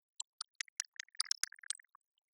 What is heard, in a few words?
denoising glock 0dB mmse